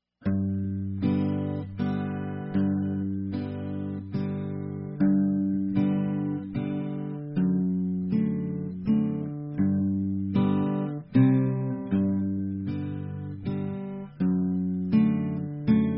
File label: Am guitar